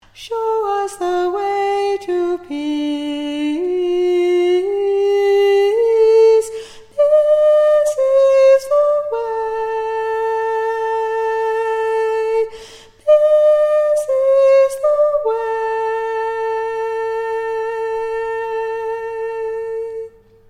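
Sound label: soprano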